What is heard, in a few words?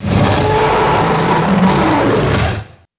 Spino Roar, Dino